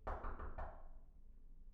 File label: knock; IEEE AASP